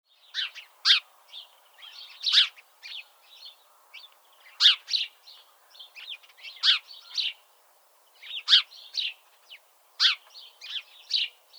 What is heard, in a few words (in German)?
Haussperling